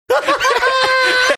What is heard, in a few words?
funny laugh